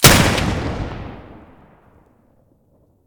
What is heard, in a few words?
muzzle beneli mono, weapons; m1014